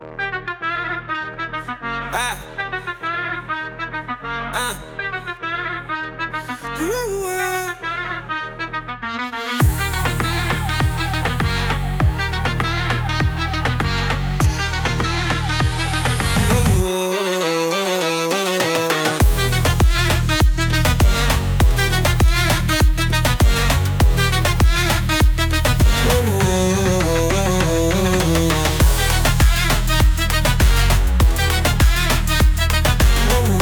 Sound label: African Voices; 新体操; アフリカの民族感あふれる、力強いボーカルとリズムが特徴の新体操オリジナル音楽。ワイルドでかっこいい世界観が魅力です。; Rhythmic Gymnastics Music; 民族感たっぷりのかっこいいアフリカン新体操曲